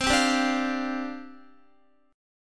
C Suspended 2nd Suspended 4th, down, Guitar

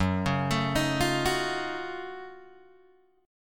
Gb Minor Major 13th Gb down Guitar DADGAD; arpeggiated

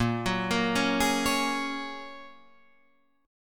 A sharp Minor 7th Sharp 5th, Guitar, Standard; arpeggiated; 6,9,8,6,9,9; JGuitar

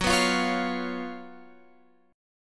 F sharp Major 7th Suspended 4th, Guitar, Open G

